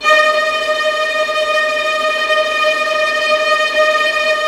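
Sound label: VIOLINT F5, Synclavier Strings; ILIO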